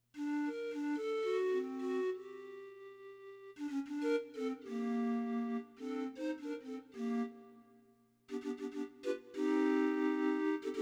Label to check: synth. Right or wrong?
Right